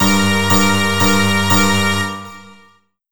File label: orchestral emergency alarm; mixkit